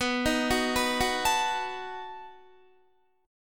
B 7th B down Guitar; arpeggiated

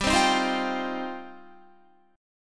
G 7th Suspended 4th Sharp 5th, Guitar

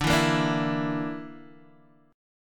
D Suspended 2nd Sharp 5th D, Guitar, Open D

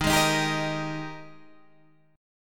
G sharp Major 7th Suspended 2nd

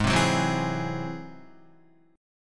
Ab Augmented 7th Ab down Guitar; strummed; Ab+7; JGuitar

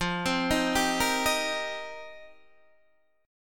F 7th Suspended 2nd Suspended 4th F Guitar Open D

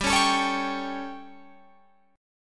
G Suspended 2nd Flat 5th G Guitar Open F; Gsus2b5; strummed

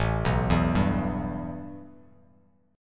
A 7th Suspended 2nd Bass Standard; A7sus2 arpeggiated

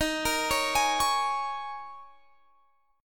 Ab Suspended 2nd Suspended 4th Eb

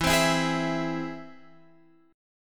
E Augmented, Guitar, Open F; E+ strummed; JGuitar

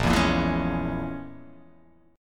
A sharp Minor 7th Flat 5th, down; strummed; JGuitar

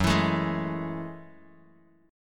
Gb Minor 9th, Bass Standard; strummed; JGuitar

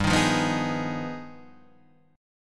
G 7th Flat 9th Sharp 5th, chord; G7b9#5 Chord {3 6 3 4 4 4} strummed

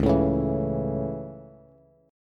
Db Minor Sharp 5th Db Bass, Dbm#5 chord; strummed; JGuitar

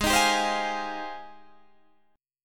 G sharp Major 13th, Guitar, Standard; 16,18,17,17,18,16; JGuitar

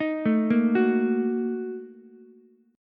Bb Major 7th Suspended 4th Sharp 5th, Ukulele; 8,9,6,9; arpeggiated

